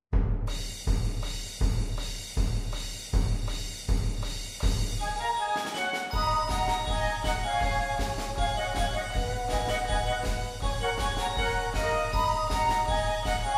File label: Hits For Marching Band